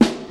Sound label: short acoustic snare a sharp key, steel snare drum tuned to the A# note; snare drum samples